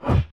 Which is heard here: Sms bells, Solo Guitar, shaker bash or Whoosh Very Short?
Whoosh Very Short